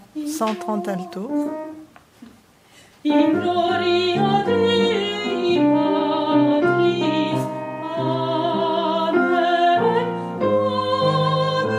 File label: alto; gloria